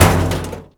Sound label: Metal Fence; Totaled; XBOX